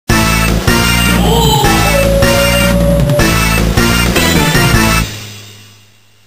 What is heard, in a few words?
pain victory; Gladiators